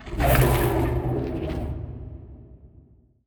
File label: void worms juvenile attack; Stellaris; grand archive; ships